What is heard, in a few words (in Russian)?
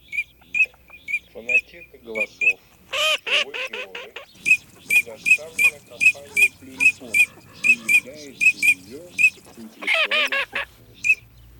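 Чирок-свистунок Alzavola, манок; plurifon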